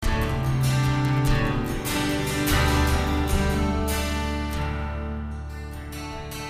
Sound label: Strummed C, G, D 74